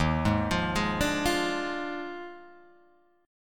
D sharp Major 7th Suspended 2nd Suspended 4th, down, Guitar, Open G